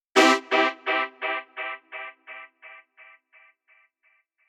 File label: Saxo Stab; Electro Dub